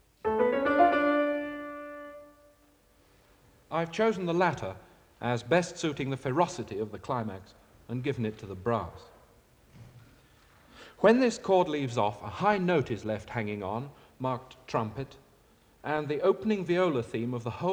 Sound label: Music Example (Piano); Deryck Cooke; Illustrated talk, Symphony No.10